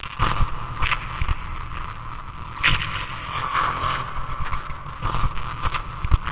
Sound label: robot termites in space, atmospherics